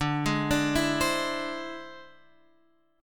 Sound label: E Augmented 7th D